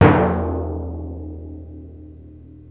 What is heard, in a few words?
TIMPANI